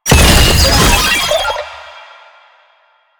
Sniper Drone Deploy; Enemies; Drones; Warframe; Lasrian

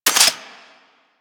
Heavy Shotgun Alt Mech; Weapons; Warframe; Tenno

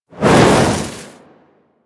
Look Whoosh; Ballas; Warframe; Quests; Chimera Prologue

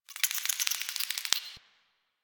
Interior Clicky Critter; Warframe; Infested Eris; Misc Indoor

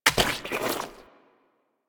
Monster Pickup Impact; Warframe; Infested

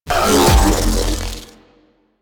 Spawn; Warframe; DE; Sentients; Repeater

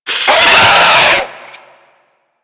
Infested Biped Pain, Vocals; Warframe; Moa

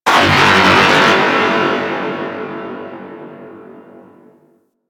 Creature Robotic; Ambience; Warframe; Corpus Outpost

